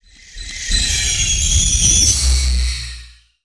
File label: Iron scrape piercing shriek